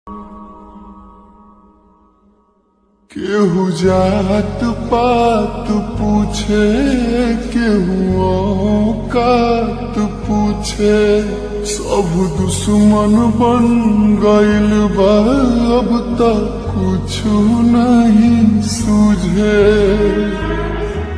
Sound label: Ringtone slowed and reverb